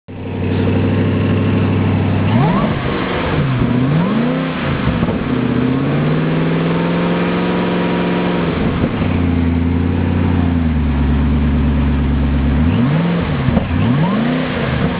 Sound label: Ford GT